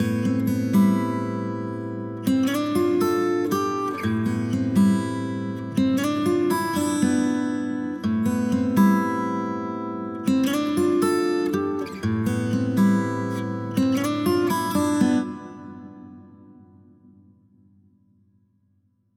EMT 140 Acoustic guitar

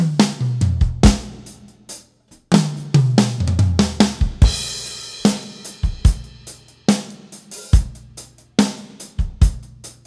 EMT 140 1.0s Drums

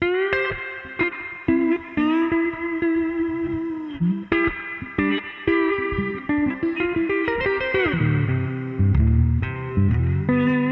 Reverbsystem 9 Mono Slide guitar

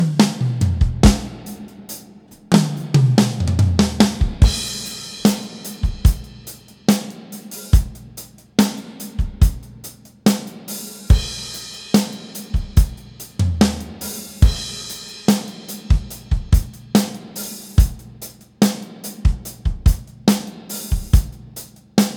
Masterroom Super C Drums

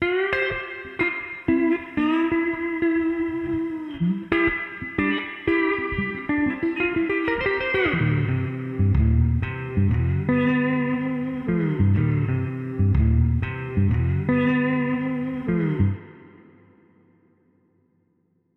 BX20 Mono Slide guitar

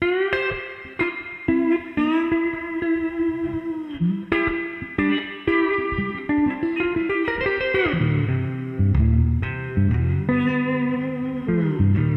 Fairchild Mono Slide guitar

